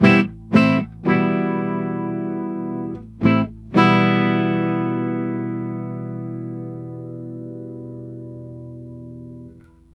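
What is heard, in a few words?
a guy strums reggae guitar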